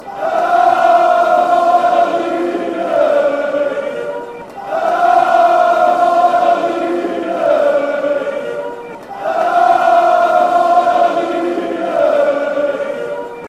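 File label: come you blues, football chants; stfc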